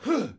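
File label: Knight Attack Vox; Civ4 warlords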